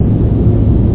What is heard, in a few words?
tank